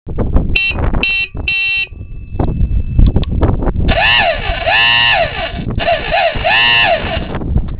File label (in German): hupen vergleich